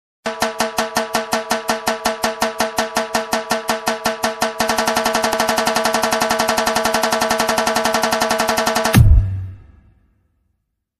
drums bgm ringtone bgm attitude bgm

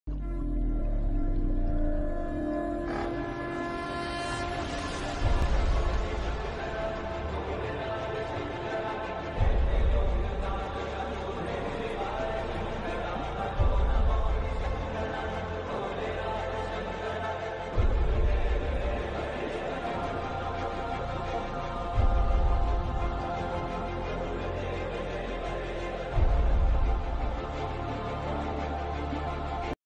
bgm telugu

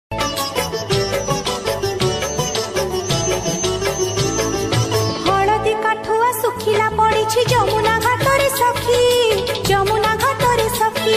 odia jagannath bhajan

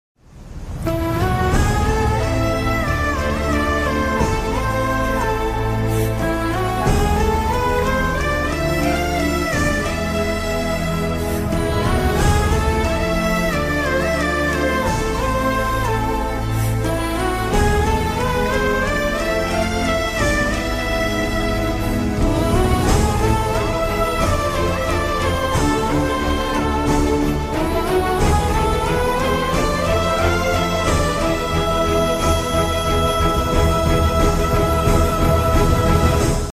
sad bgm; Teddy movie

